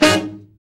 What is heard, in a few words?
BRA SECT; BRA SECTIONS